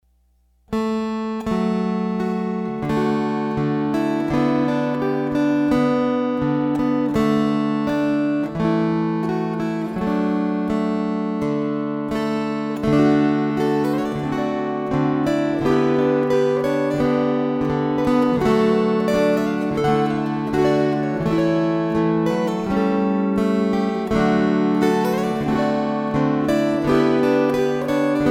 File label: String Choir